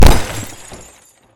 Collision Person Medium

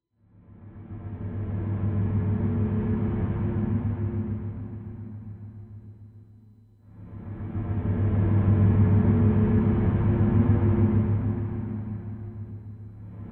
Subway Gas Mask Explore Mood

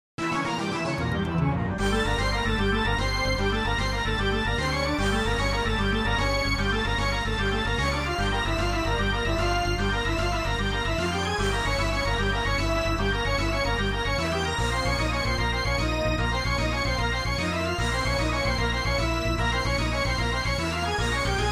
Midi Battle; Shining Plume 2 Windows gamerip 2017

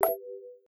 puzzle button on

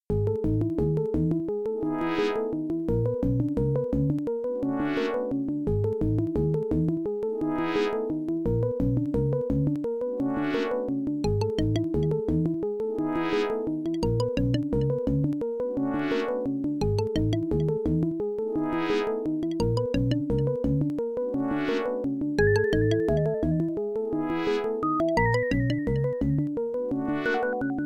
Adlib; Lollypop; MS-DOS

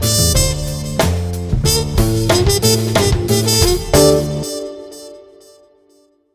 Puzzle 3d Jingle; Miles Style

